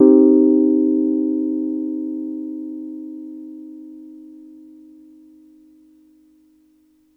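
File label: Piano Wurl minor soft; TrashLibrary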